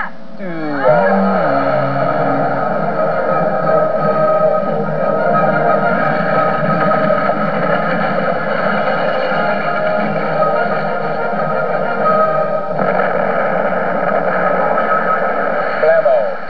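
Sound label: orchestra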